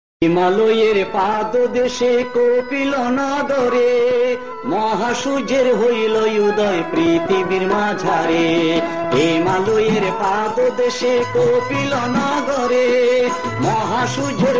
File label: bangla buddha kirton